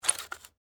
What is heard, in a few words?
Shutters Open, Window, Slide; Household